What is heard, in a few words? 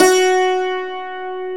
Hammer Dulcimer F#2; Multisamples; Digital Sound Factory Planet Earth